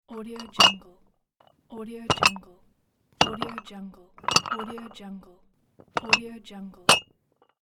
dumbbells

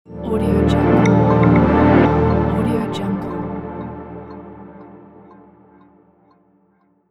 opening ambient logo; slavadyadyun

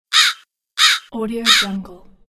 crow

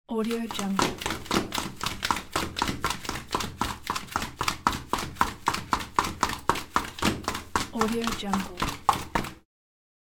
running and walking in flip flops

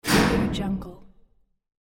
metal impact; kai-andersen

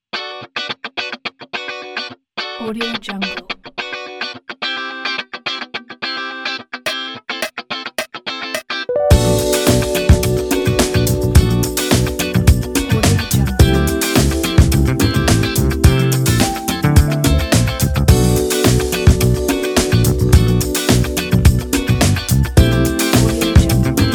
funk; funk company

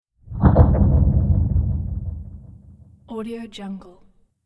trailer gloomy impact

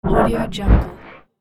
wide whooshes

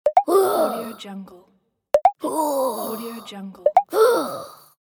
emoji surprise

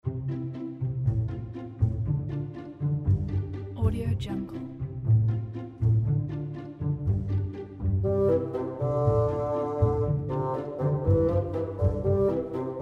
good day

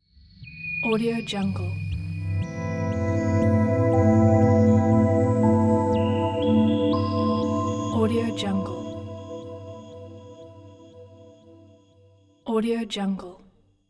abstract ident

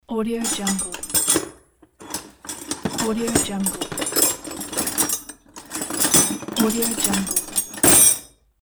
searching in the toolbox; realitybeats

